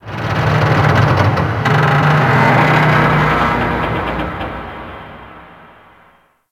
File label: Ocean Underwater Metal Creaks Large; Ambience; Warframe; Grineer Ocean